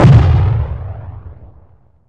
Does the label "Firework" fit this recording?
Yes